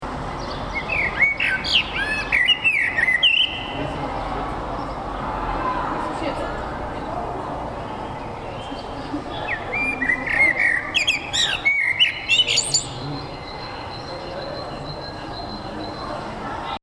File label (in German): city amsel